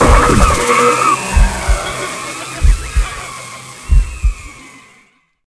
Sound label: dark up, sfx; Shadow Man